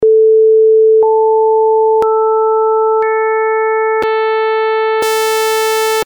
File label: build sawtooth